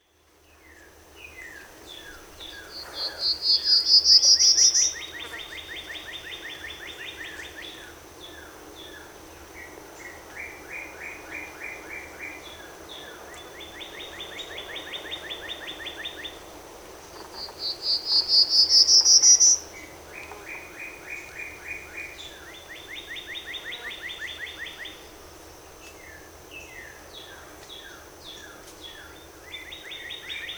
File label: Prairie Warb